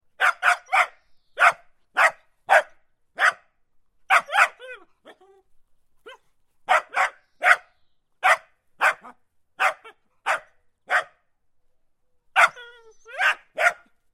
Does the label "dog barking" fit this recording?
Yes